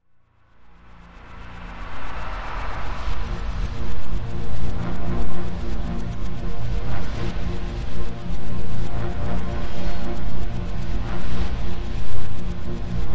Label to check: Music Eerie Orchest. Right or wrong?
Right